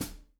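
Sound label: hi-hat, JAZZDRUM, right; Ueberschall; Drum & Bass Flash Trackz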